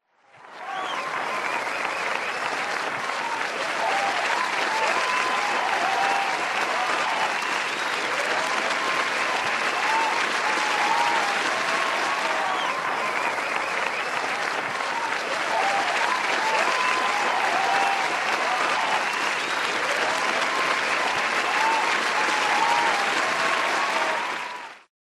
applause, reverse

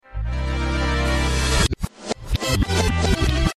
course clear

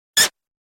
the sound lizalfos make in ocarina of time

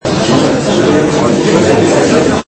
crowd

